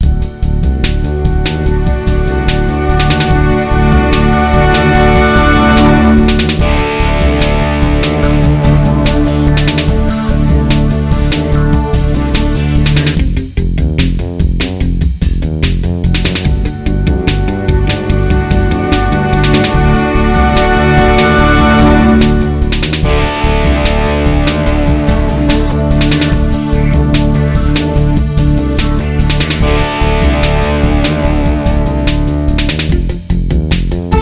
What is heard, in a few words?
Back Loop; Touchmaster 2; NDS